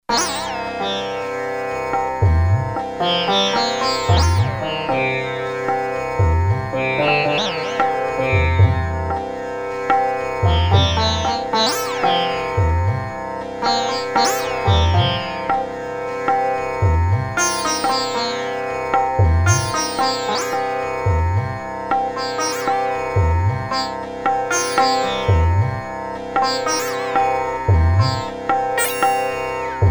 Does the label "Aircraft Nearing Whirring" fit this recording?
No